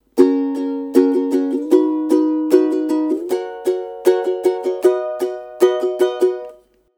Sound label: strum, ukulele